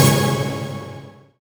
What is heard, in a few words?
SYN DANCE; Sound & Vision - Gigapack II